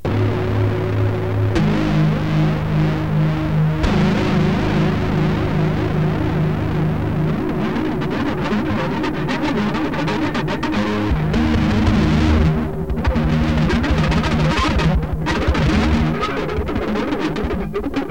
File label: guitar